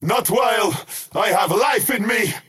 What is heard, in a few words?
skywrath crown deny pain; skywrath mage